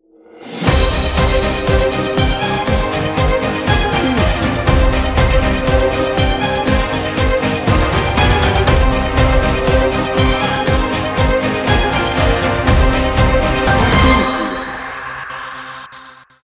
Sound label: warrior march